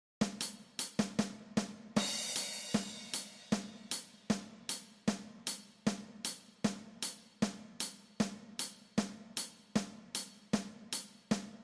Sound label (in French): Set de batterie; Percus; Caisse claire